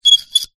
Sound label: metal small clip slide squeak; foley